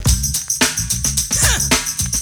BEAT, BREAKBEATS; Crypton - DrumBase5000

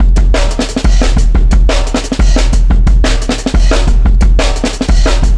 Marching Kit 4 Bar, Drum n Bass Beats; KJ Sawka; Drum n Bass & Beyond

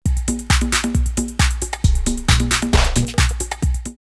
drumloops Techno House 134; Suburbia; X-Static Goldmine

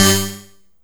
Synth Hit G

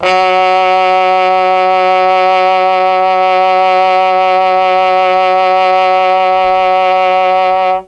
F#2 TROMBONE, Mellotron; CHMB TRMBONE; Mike Pinder - Mellotron Archive CD

